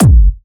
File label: Techno, Kick; One Shots; GhostHack; Ghosthack - Free Techno Sounds 2024